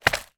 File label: food bowl plastic of candy down on hard surface; zapsplat